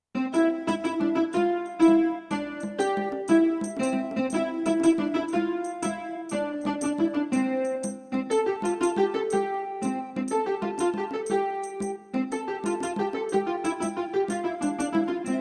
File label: Tavern; Azural Kane's UO Juke Box